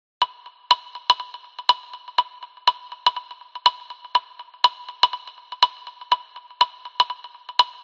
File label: bling melody